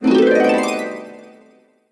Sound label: Harp, ringtone; xiaomi